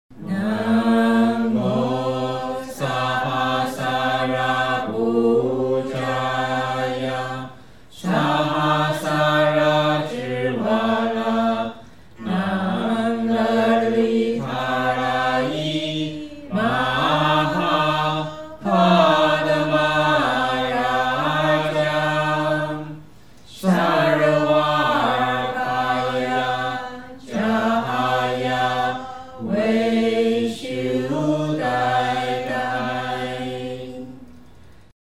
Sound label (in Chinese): 大悲咒咒頭 Mantra Gwenyin Heart